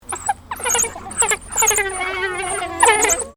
Animal African Wild Dog whining, Wild Dogs in a zoo habitat; Miscellaneous Animal; Animals